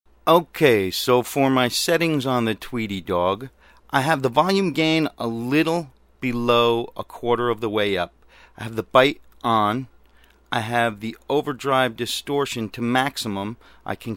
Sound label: tweed dog settings